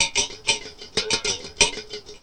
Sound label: METALLIC DUD, rhythm loops; Rarefaction; Poke in The Ear With A Sharp Stick